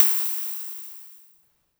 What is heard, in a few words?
polyvox hat, Drum Machines, Formanta Radio Plant Polivoks